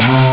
metallfloete; ST-XX Amiga Soundtracker Sample Packs

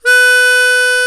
MUNDHARMO; Alpine Volksmusik

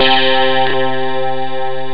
higlocke; Amiga Soundtracker sample

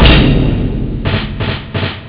pauke + drum; cadaver; Amiga Soundtracker Sample Packs

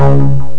bomm; ST-XX Amiga Soundtracker Sample Packs

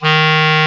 Klarinett; Alpine Volksmusik

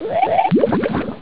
blubber; Amiga Soundtracker sample

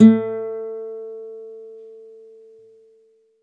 KONZERTGIT, Alpine Volksmusik

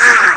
gurgeln; Amiga Soundtracker Sample Packs

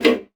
Klappe; Xsample VOL-3; STACC+FX

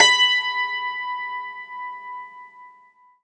HACKBRETT H2; Alpine Volksmusik

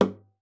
KLOPF, GUITAR FX; Xsample VOL-2

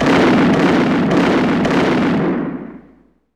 SCHUSS; Best Service; Advanced Mega Effects

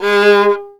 GEIGE; Best Service; Alpine Volksmusik

